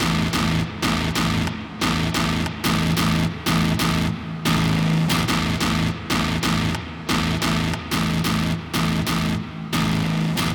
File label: Music boss - Intro Guitar loop; Music boss 2 - Intro Guitar loop